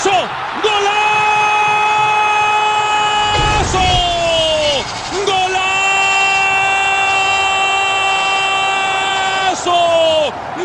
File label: goal, goal crowd roar